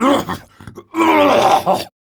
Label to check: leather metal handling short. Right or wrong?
Wrong